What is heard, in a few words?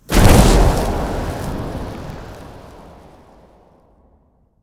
shout frost breath